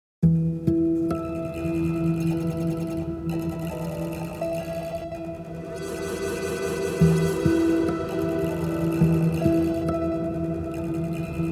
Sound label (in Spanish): Fantasia de arpegios; classical guitar & electronics